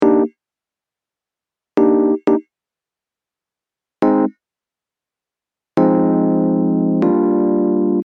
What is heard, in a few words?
key